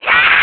Shock; Link getting shocked (3rd sound)

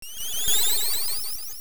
Instruments Appear; The Sirens' Instruments appearing to wake the Wind Fish

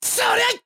Attack

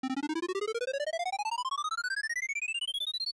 Magic Refill; Magic bar refilling; When heard in-game, this sound is panned 40% to the left, and only played for up to 1.25 second

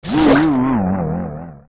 Hit; Bongo Bongo; zelda; OOT

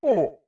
Gasp; Goron Link's gasps after a big jump

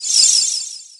Taunt Fairy; Link releasing a fairy (side taunt); Zelda

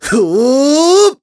Run Attack Run; Ghirahim

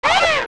Hit; Lizalfos; zelda; OOT

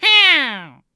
Hyaa; "Hyaa!" (longer); The Great Tripini: "Hyaa!" (longer); zelda

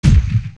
Roll Wall; Link rolling into a wall (also used when landing from high jumps)

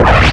Spin Attack Charge; Charging Link's spin-attack (6 pitches, played 1/6th of a second apart; also used by Toon Link)

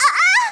Hit; Zelda getting hit

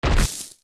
Bush Cut; zelda; OOT

